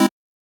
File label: Chord